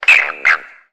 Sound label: Frog Tree Call Eerie S